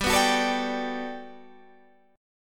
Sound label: G Major 9th, Guitar, Standard; GM9 strummed; JGuitar